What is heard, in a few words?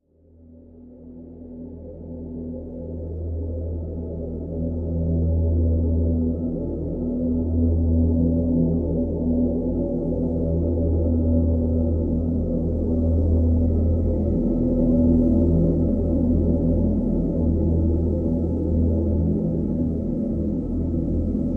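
Drone River Crossing E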